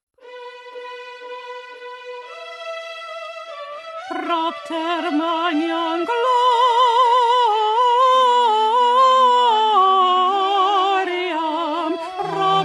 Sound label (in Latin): propter magnam contralto